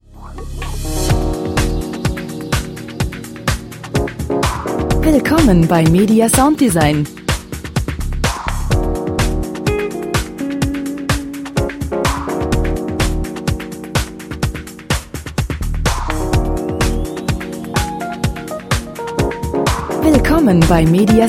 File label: lounge wave club